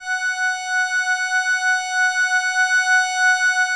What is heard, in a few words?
LAMBDA, String Machines